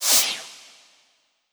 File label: panel out